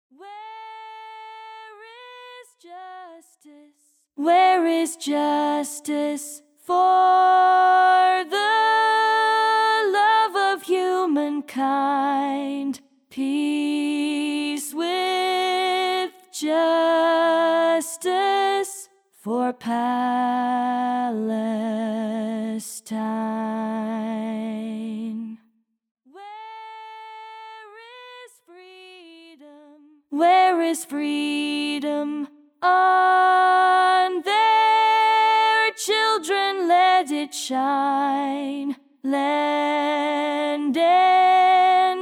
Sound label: voice